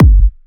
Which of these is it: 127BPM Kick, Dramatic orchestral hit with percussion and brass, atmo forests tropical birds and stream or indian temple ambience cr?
127BPM Kick